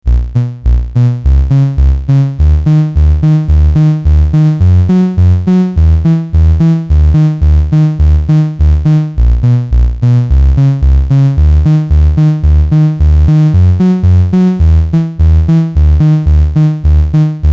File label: adsr vca